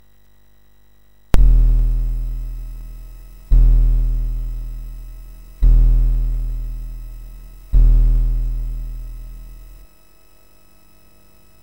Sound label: C64 SID6581 noise; The original 6581 SID, on a C64; The SID input is tied to the ground with a 220 ohm resistor to reduce; the .mp3 recording